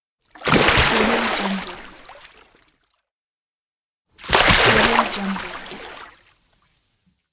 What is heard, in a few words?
dive